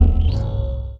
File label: Stab